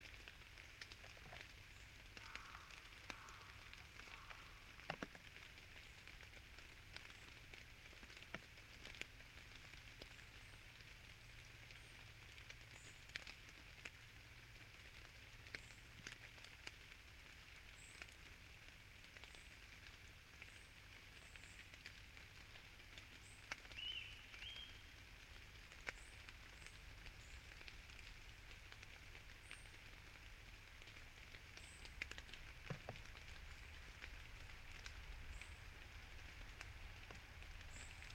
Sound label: yamanakako sound